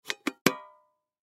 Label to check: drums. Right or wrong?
Wrong